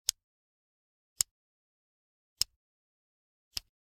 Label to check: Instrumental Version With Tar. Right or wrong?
Wrong